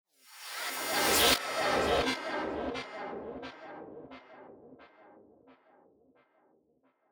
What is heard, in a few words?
Swept Noise